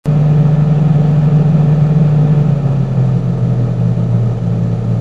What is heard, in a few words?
blowing, samsung refrigerator